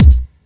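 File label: Bass Drum, Bleep Hop 124bpm; Construction Kits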